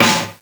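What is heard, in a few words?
Fairlight IIx CMI snare 3; 300 Drum Machines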